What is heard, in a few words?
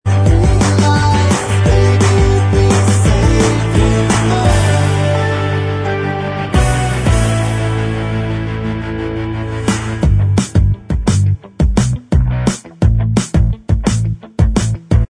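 backing vocals track, backing tracks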